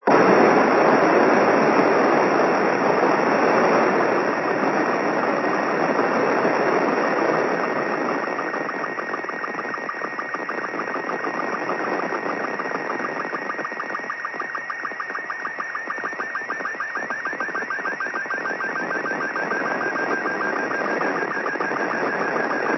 co-66, Satellite Recordings; 10:10am Pass; I-Com IC-R20

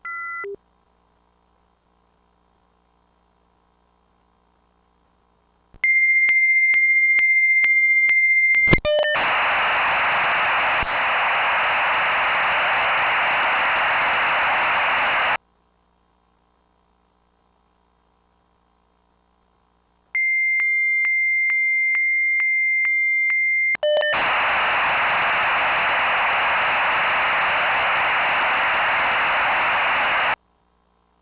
v32 wrong, modem; modemlab

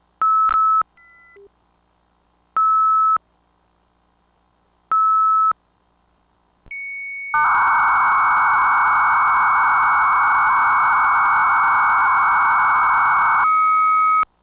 bell103, modem; usr; modemlab

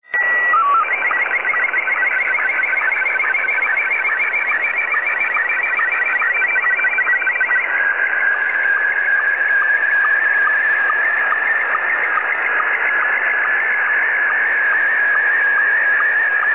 Part of an Amateur Analog SSTV Transmission

